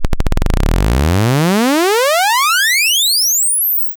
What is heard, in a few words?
oscillator sawtooth; webaudio; chromium